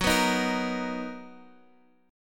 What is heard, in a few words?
B Major 7th, guitar chord